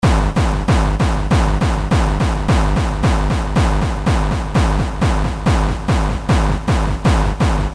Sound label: Fuzz Fuzz Loop